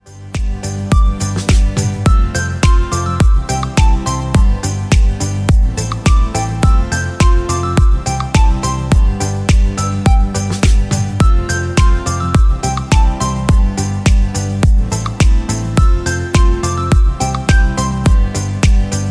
Advertising Happy Electronics; MIUI12

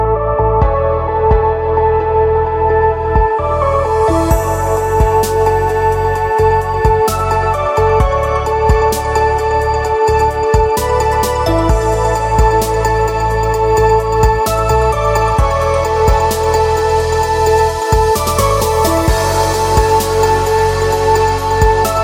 Pop Inspiration; MIUI12

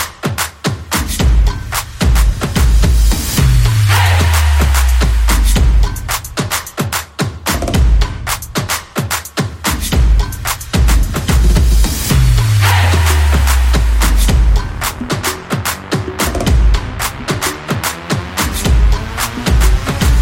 Fast Slide Percussion, RINGTONE; MIUI12

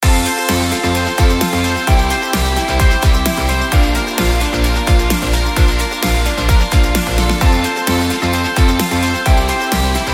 Rock; Rock Summer (30 Sec); MIUI12

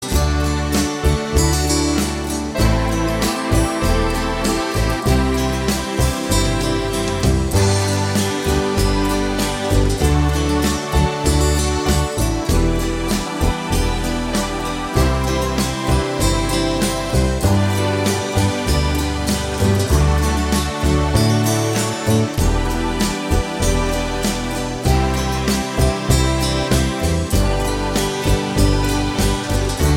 Instrumental; MIUI12

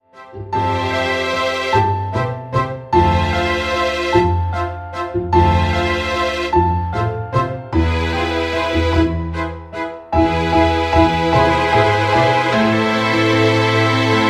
A dramatic scene; MIUI12

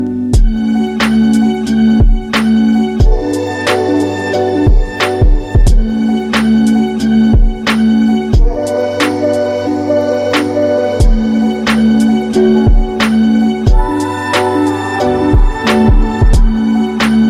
Classical and Lofi; MIUI12

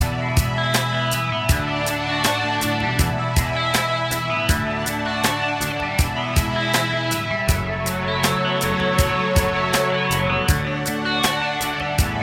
Instrumental; LEAVING

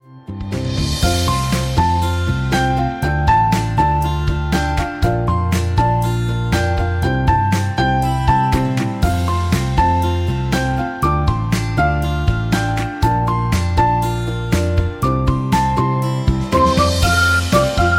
Beautiful car pop music, ringtone; MIUI14 and HyperOS 1.0

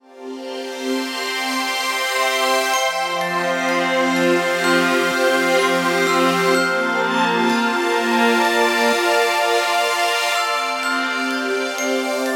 Repeated notes; MIUI12

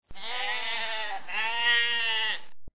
Sheep; MIUI14 and HyperOS 1.0

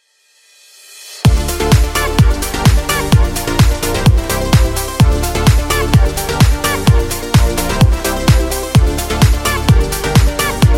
Pop Party; MIUI12

